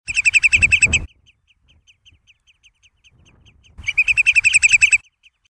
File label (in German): Regenbrachvogel, Vogelstimmen; Ruf